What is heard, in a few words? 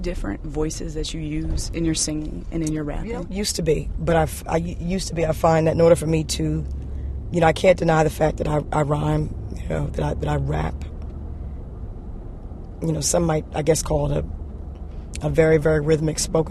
rap